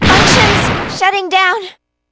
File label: shutdown; aigis; p4u